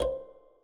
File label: synth